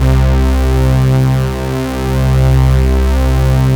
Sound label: SYN, DANCE SYNTH; Sound & Vision - Gigapack I CD 2 (Roland)